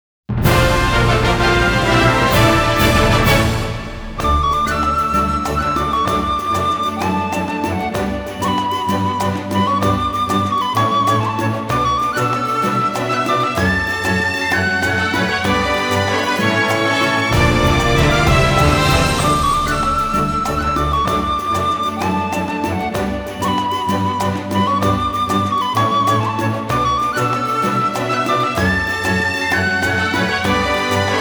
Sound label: Victory Fanfare; octopath traveler